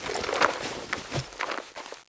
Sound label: c4 disarm; cstrike